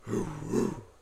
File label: rumbly wwuuuu